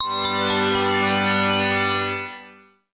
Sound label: Music Main menu - Jingles - Exit main menu; Smart As...; PS Vita